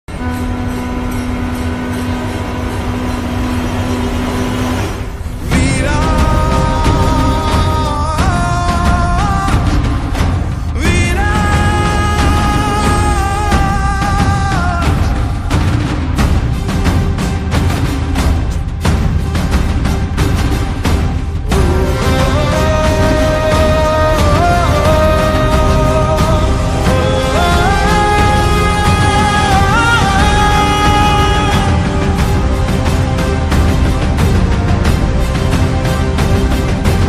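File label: background music ringtone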